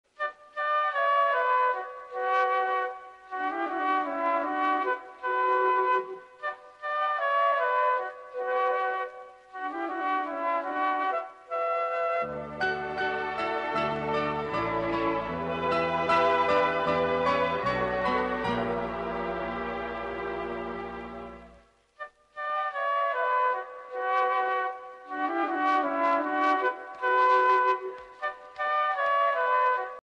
Kadhal Kottai Bgm, Tamil; Ringtone

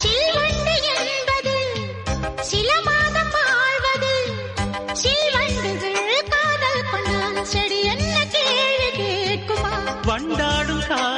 Tamil Song Ringtone; Amaravathi

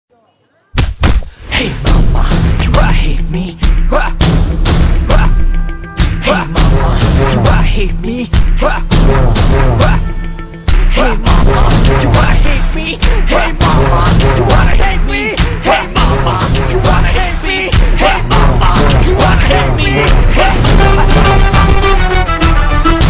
Tamil South Indian, ringtone; Sethupathi Movie

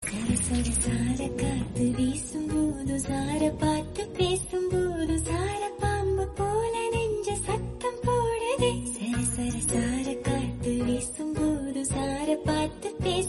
Tamil Song

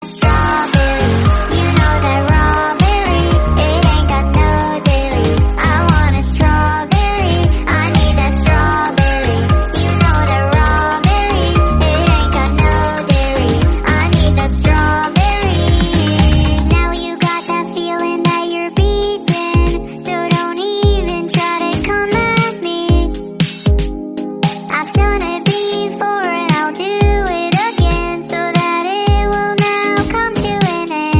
English Song; ringtone

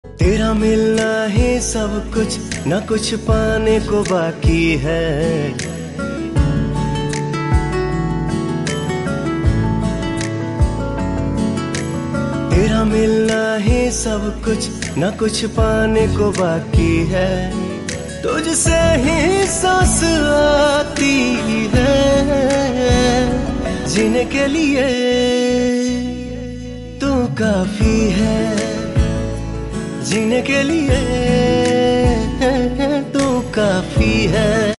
Song Hindi

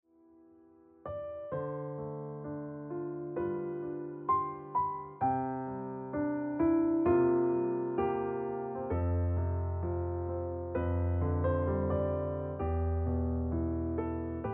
Piano Music

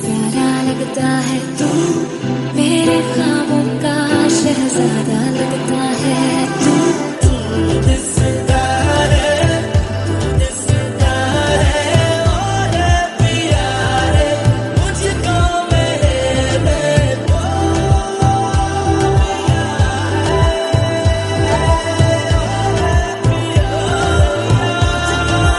Hindi

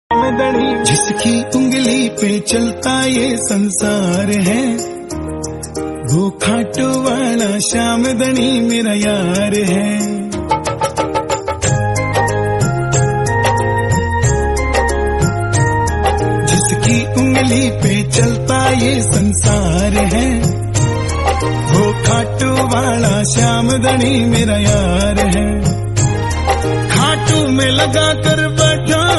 Devotional Bhajan Hindi, ringtone; Khatu Shyam

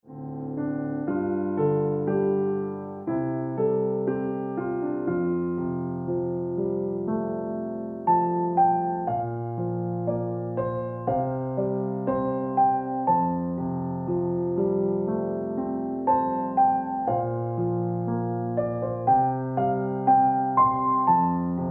Piano Music

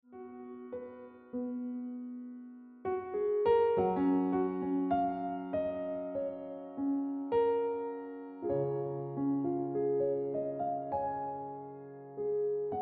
Ringtone Piano Music

